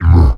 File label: MONSTER Grunt Short